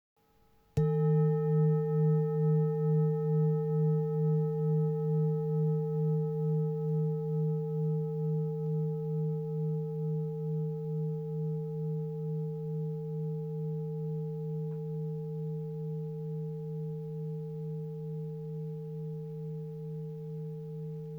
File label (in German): shiva, sakralchakra klangschale